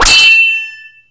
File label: MagicItem GhostSwords Impact